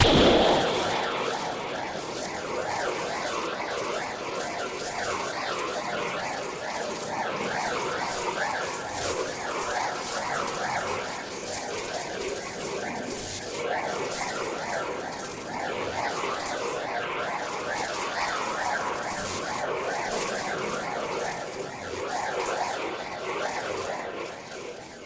anime aura burst with lightning

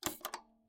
TV Button Press; SoundsCrate

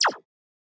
scifi weapon synth blaster

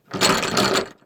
door handle shake; SoundsCrate

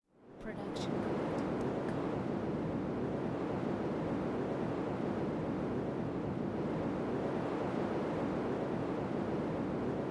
wind distant; soundscrate

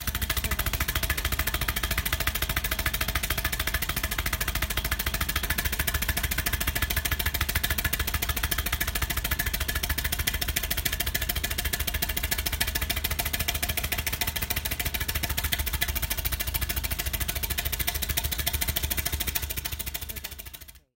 helicopter; soundscrate

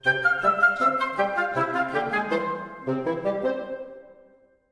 Friendly Ident Woodwinds Bells; SoundsCrate

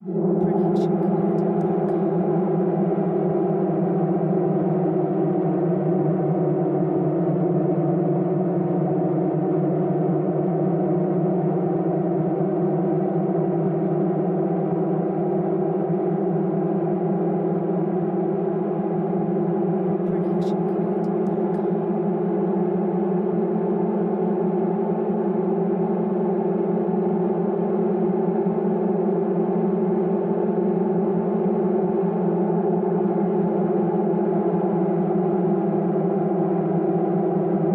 Ambience Descending Rising

